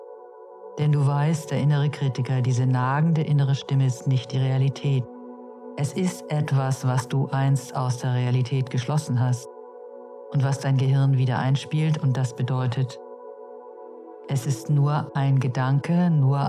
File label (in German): Musik; wenn trauma dick macht